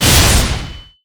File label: Charge Slash; Illusion Knight